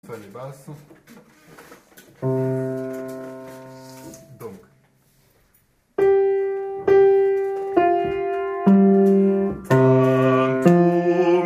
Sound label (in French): Basses; Tantum Ergo Sévérac